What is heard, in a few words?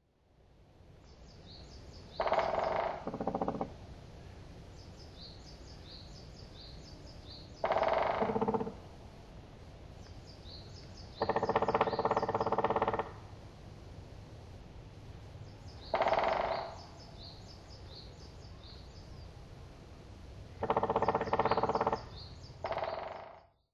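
Black Woodpecker; kumagera; tomakomai; koe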